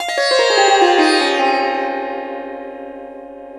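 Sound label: sitar glissando; Spectrasonics; Heart of Asia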